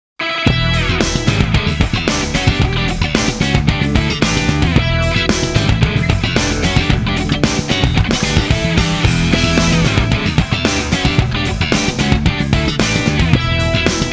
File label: Jingle A; Sonic Adventure